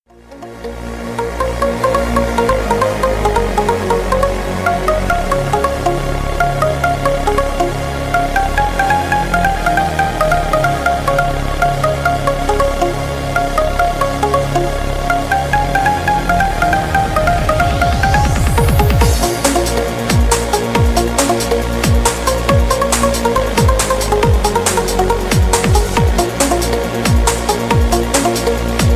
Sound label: remix